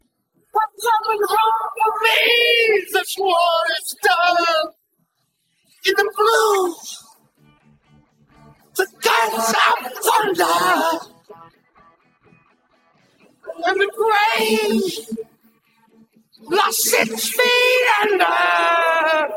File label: vocal